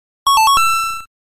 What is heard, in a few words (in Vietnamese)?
am thanh cau tra loi dung, right answer; capcut